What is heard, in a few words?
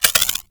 Freeze Ray Mech Chamber; Warframe; Weapons; Corpus